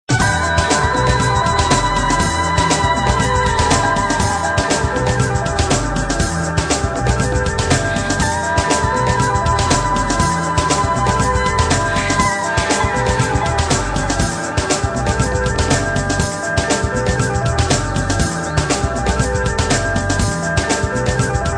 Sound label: Loop